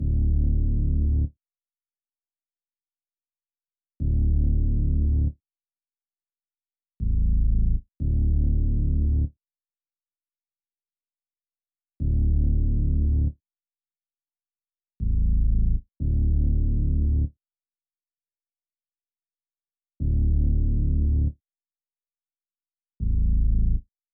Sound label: 120 BPM D Min Bass; Cymatics; Destiny Gold Expansion; Myst